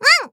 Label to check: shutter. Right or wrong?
Right